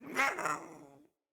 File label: Pet Speak; Catbrow; Pet Ship